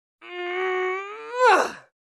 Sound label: Labor Grunting